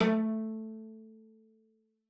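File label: CELLO spiccato A3; 90 s Sample CDs; Xsample VOL-5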